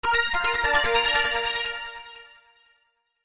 Menu Close; JustAxe

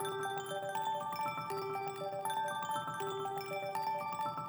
Bubble

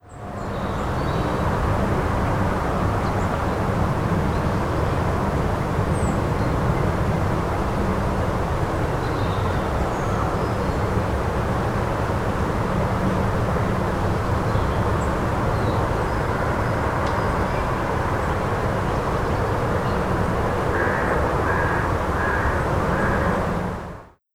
Cities rome Park; Google Earth VR

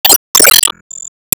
Item Get

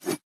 Swing Sword Short

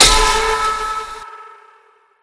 Fence Hit; Thugs

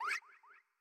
Bat Aggro

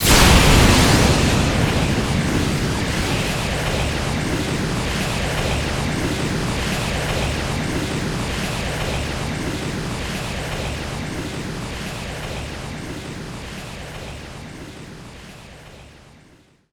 Alliceball Roll Loop

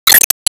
Swing Miss Gold; Se Swing Miss Gold

